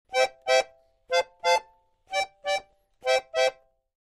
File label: Accordion Happy Rhyt; keyboards